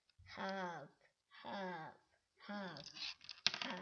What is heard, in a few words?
harp